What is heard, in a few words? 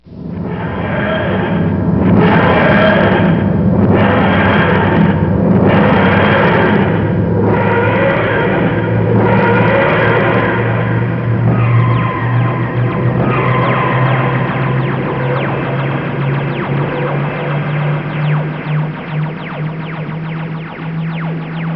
tardis